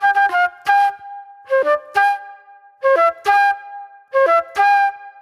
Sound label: Soul-Jazz Flute, Call And Response